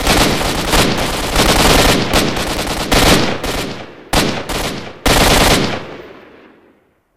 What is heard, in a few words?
gunfire, ambient